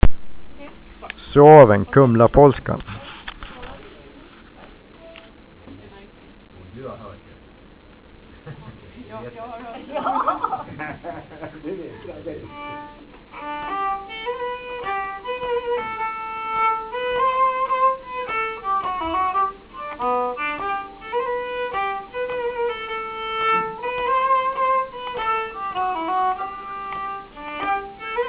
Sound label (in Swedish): Polska; Polska efter Zuaw